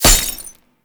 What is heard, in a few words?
Tank Weakpoint Impact; Warframe; Lasrian; LasrianTank